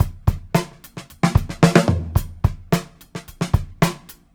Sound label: Live Beats, R&B Drums